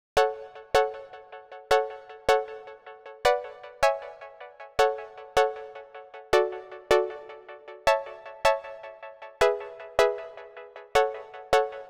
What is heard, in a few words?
pluck